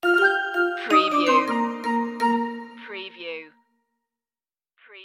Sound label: scooter ride stinger